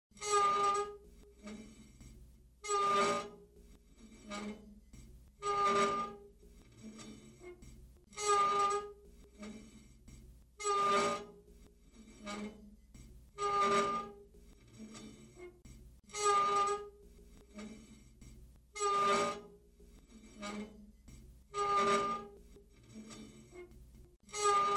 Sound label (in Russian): mkrip nizkij so skrezhetom monotonnyj